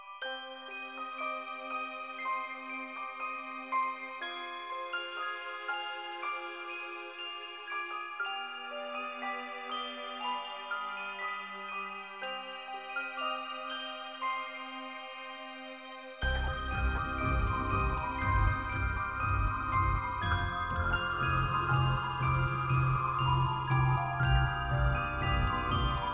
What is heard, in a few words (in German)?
Spieluhr